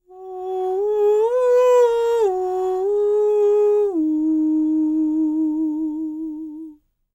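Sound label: falsetto, Vocal Planet Jazz FX; Spectrasonics; SA FALSETO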